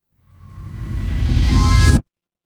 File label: transition riser